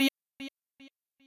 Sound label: voice